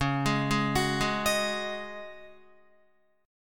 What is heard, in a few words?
Db Suspended 2nd Suspended 4th, Guitar; arpeggiated; 8,11,8,11,8,11; 4F,4A,5C,5G,5C,6E; Dbsus2sus4